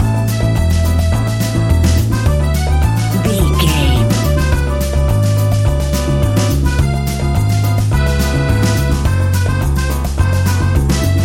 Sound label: jazzy latin exotic spanish music; An exotic and colorful piece of Espanic and Latin music. Great for Zumba